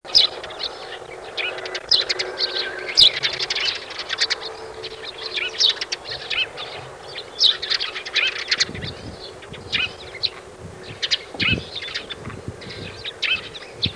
passer montanus

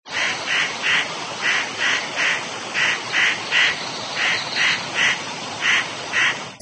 nucifraga caryocatactes

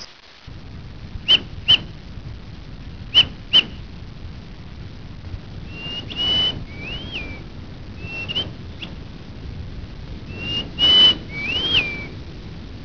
pinicola enucleator

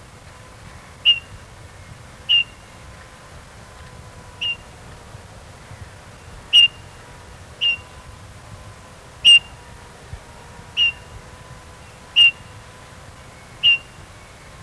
pyrrhula pyrrhula

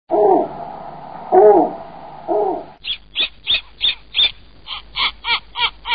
nyctea scandiaca

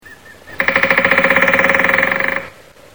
dendrocopos leucotos

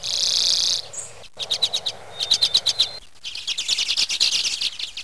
acanthis flammea

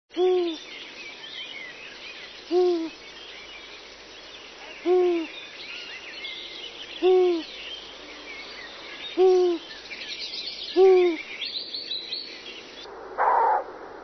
asio otus

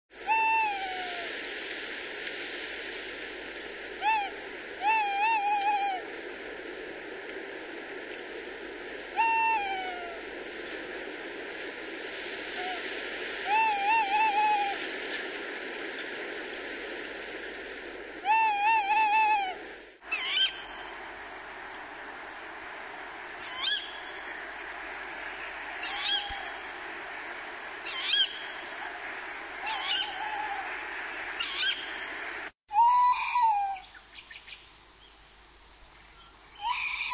strix aluco

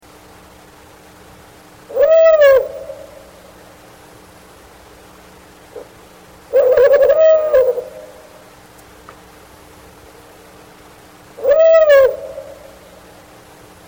bubo

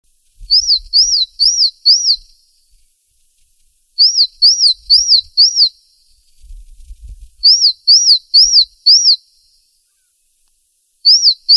Parus cyanus

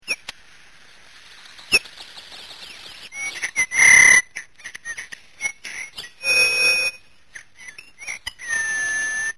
pyrrhula pyrrhula

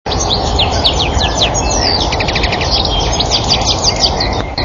carduelis carduelis

